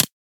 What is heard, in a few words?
LFO Clap; Monosounds